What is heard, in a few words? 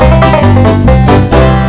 Funny Banjo